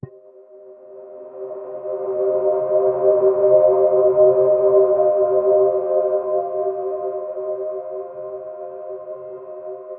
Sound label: soft ambient, ethereal